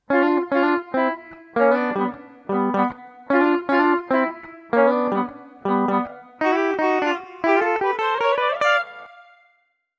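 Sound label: G Mixolydian guitar upper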